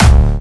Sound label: Distortion Kicks, Bassdrums